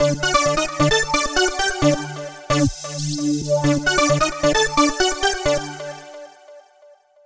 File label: Lead Synth